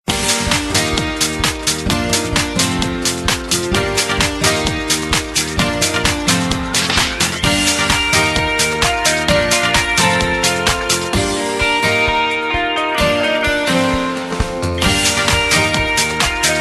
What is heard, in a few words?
Waves; Motorola